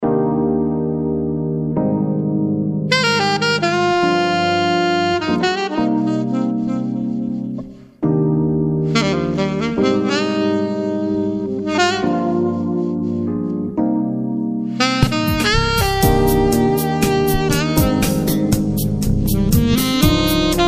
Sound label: lounge music; CD Produktion "Lounge Music"; CD mit eigenen Kompositionen.